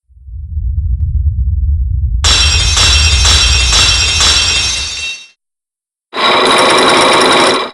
lock end; psyche lock

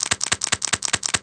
nibble; bruce

